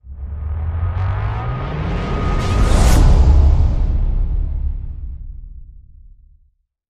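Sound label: Crescendo Slide Up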